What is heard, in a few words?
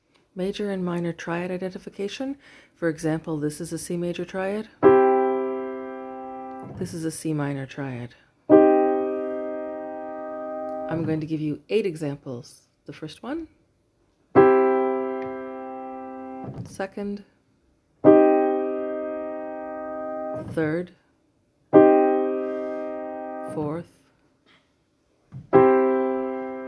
Voice 006: Major and Minor Triads; Interval Identification